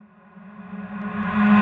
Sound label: Tonal Reverse, G#; House; FX; Crocodile Tonal Reverse